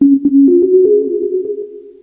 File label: synth melody; melodic samples; synth and electronic